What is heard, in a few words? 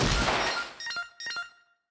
Damage